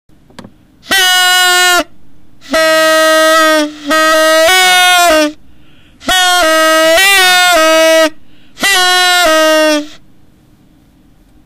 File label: noisemaker; Horn, states only "Made in USA"; Halloween noisemakers